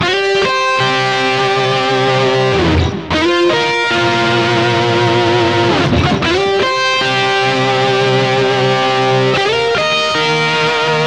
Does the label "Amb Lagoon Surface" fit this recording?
No